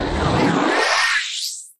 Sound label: Dirty Noise Transition